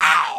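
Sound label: RX PERCUSSION; Kid Nepro; Elite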